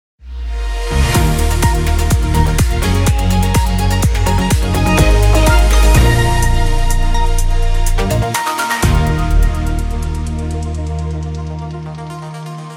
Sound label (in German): Nachrichten A ohne SV